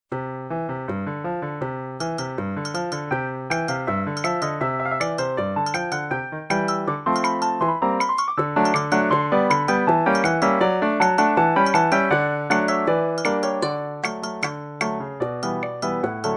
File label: Frolicsome Polka Arrangement